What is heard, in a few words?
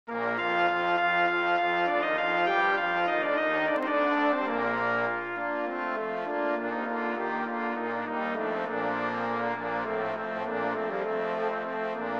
Brass Quintet; Psalm XIX